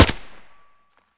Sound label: ak74u silencer mono; STALKER; IWP